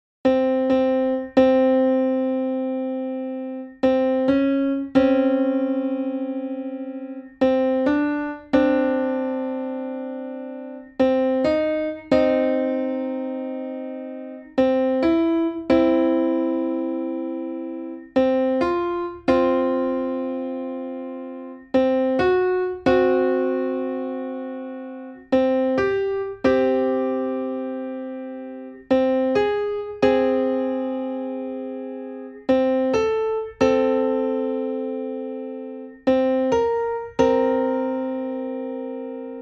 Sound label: music